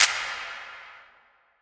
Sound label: Reverb Clap